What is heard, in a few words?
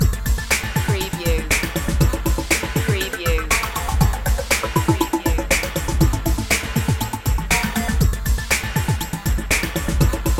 fabricator loop